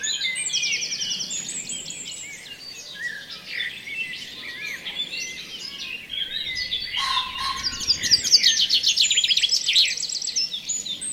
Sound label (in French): Réveil des oiseaux